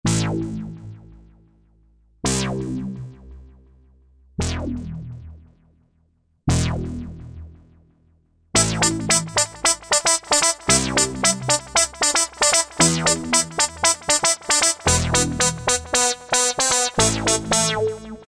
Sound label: Maxi Fuzzy; Roland SR-JV80-11 Techno Collection